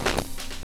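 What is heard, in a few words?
Fill Snare 090, Lounge Lo Fi Break Fillz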